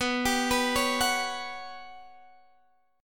G Major 7th Flat 5th

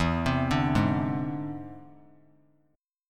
E Augmented 7th E down; E+7 arpeggiated; JGuitar

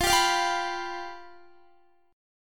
F Suspended 2nd Flat 5th, Guitar; Fsus2b5; strummed; x,20,19,22,19,19

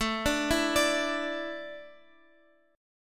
A Suspended 4th A Guitar Open F; Asus4; arpeggiated; x,x,9,9,7,9

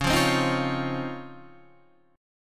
C sharp Major 9th, Guitar, DADGAD

